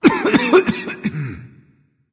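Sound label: nonverbal clear throat; smite; voicepack; ullr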